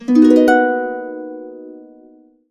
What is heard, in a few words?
HC BMAJ7, Complete Harps; USB Soundscan vol.62; AKAI